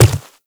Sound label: Quadruped Medium Walk Dirt, Footsteps